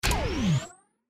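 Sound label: Button TURBO; Keno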